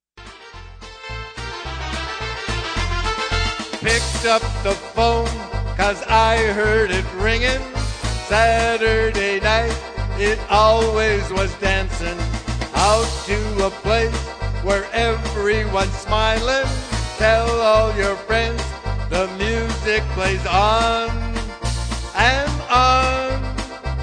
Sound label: Polka Music